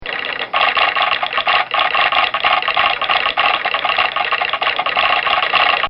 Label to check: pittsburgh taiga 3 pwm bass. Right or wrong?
Wrong